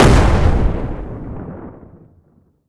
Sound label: Explosion Large; jasem just another shooter with electronic music windows 2017